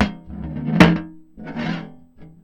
Tin Tray, Marbles, contact mic

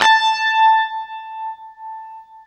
UNIVIB A, Guitar; East West; Steve Stevens Guitar Samples Collection

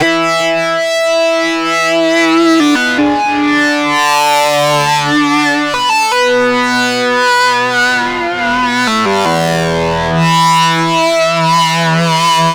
Feedback Lead; Dr. Fink's Funk Factory

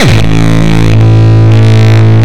Longkick, kicks

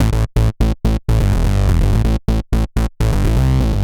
125 A, bass; musicradar; bass boutique

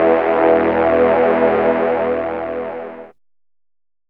DR. LOOP, TRANCE; X-Static Goldmine 3

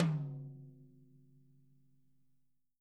T10 MF R, TOMS; Living Drums; Sampleheads; Peter Erskine; PB KICK+TOMS

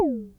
SYNCUSSION

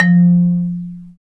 Bass Marimba

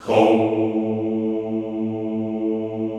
HOUUU, Classical Choir

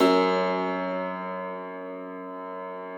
Thumb Tack Piano ff ped; Total Piano

